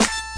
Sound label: snare buzz; drums single hits